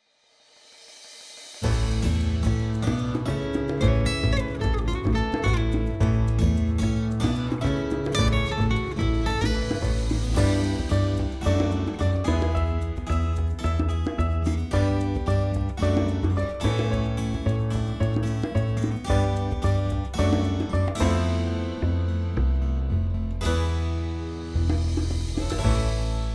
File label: Acousticon